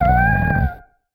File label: cry; Barotrauma; Barotraumatic; Yellowboy